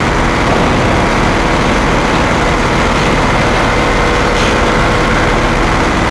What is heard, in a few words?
truck driving; Max Payne; tenements